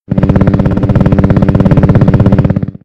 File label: Propeller; Doodle Jump; 3DS, Android, Arcade, DS, iOS, Mobile, Xbox 360